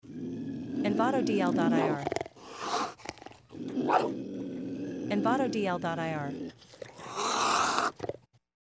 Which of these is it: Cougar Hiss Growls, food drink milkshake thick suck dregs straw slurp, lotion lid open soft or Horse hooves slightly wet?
Cougar Hiss Growls